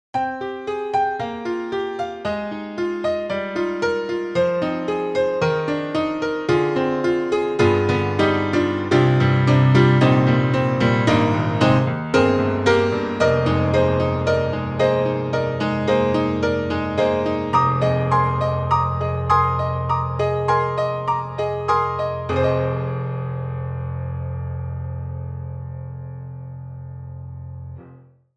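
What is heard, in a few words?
Condensed Rock, piano; Steinberg HALion 6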